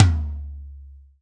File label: TOM 2; Best Service; Alpine Volksmusik